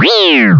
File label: OOhh; KARALL 1.13.3